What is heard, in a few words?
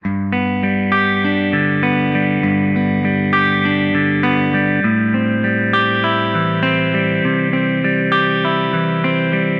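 Guitar Pop G major 100 bpm; Guitar and Bass; Ableton; Factory Packs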